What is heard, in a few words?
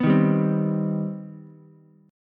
C Minor Major 7th, Ukulele; strummed; JGuitar